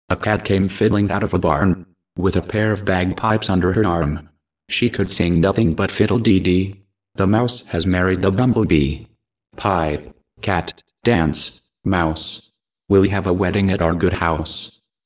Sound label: Cat Came Fiddling